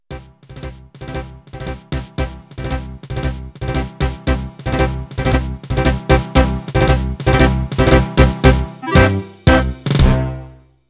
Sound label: Pasodoble Intro